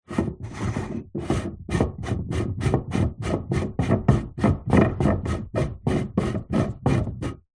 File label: wood rail turn rotate on wood support; foley; zapsplat